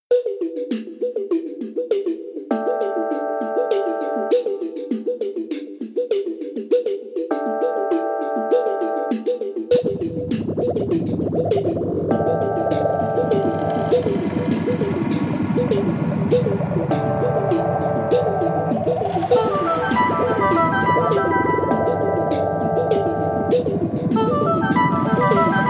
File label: bubble